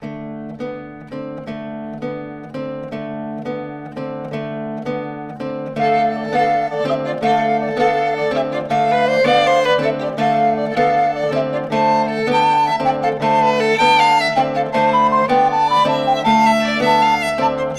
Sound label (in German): Miniatur, Zither